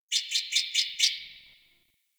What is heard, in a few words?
Tree Critters; Warframe; Duviri